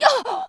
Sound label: pickup; jailbreak